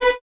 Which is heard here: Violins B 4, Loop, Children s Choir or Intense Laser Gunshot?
Violins B 4